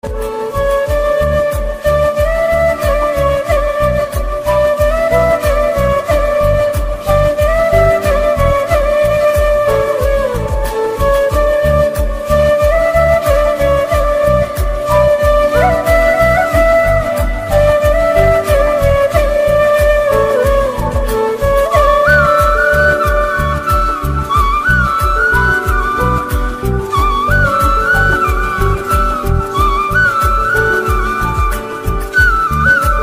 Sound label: Tamil Love Bgm